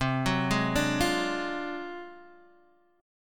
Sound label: D 7th Sharp 9th Flat 5th C, chord